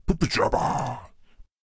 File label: Attack Vocals; Zeus